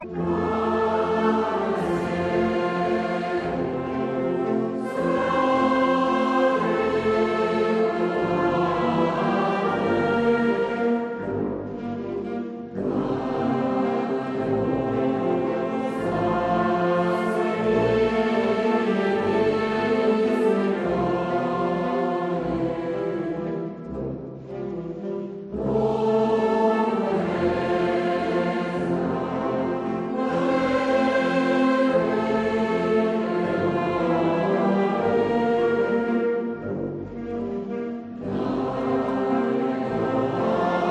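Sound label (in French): Choeur Esclaves, Chœur; Verdi; Nabucco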